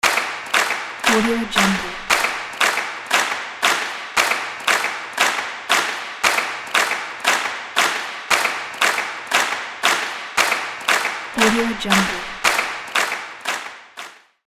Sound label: stadium claps